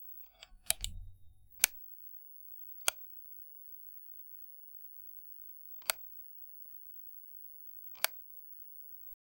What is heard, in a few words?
light turning on